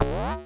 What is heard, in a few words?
hatch open; dos lem